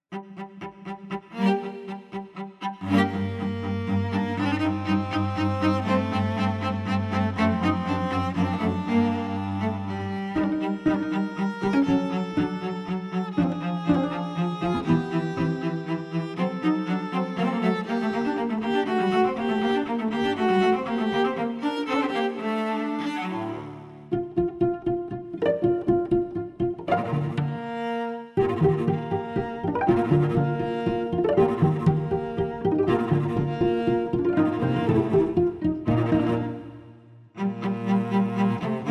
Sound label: Cello Arrangement; La poule Rameau